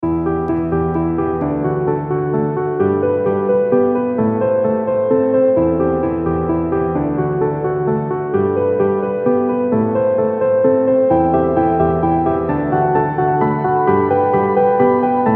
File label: sky piano